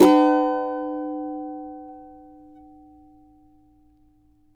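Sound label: C# minor, Guitars; Spectrasonics; Hans Zimmer Guitars VOL-2; CAVA CHORDS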